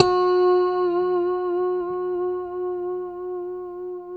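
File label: G4 TAP harmonic, guitar; Spectrasonics; Hans Zimmer Guitars VOL-1; JC-TAPONICS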